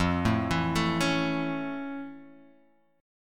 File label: F Augmented Major 7th F Guitar Half Step Down